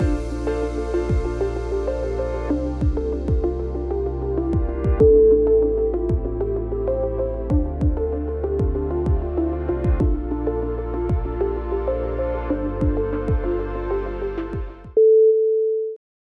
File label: on hold